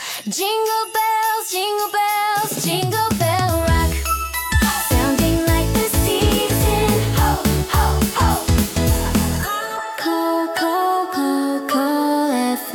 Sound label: Jingle bells… Jingle bells.... Jingle be